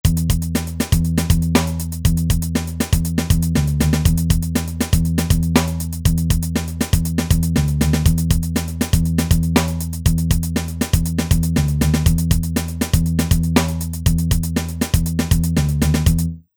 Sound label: Pop